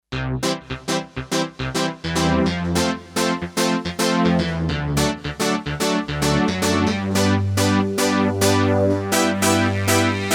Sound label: Kawai K5000S; Protonic